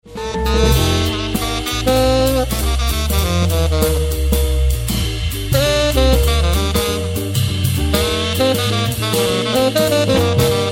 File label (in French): Extrait Sax